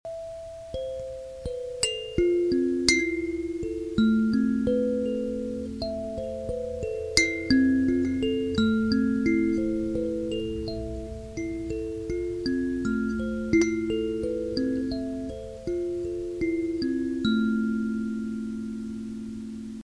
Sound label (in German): 9-Ton Taschen-Kalimba